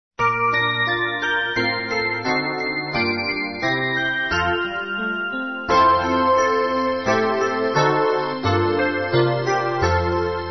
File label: Contemporary Christmas Jingle Bells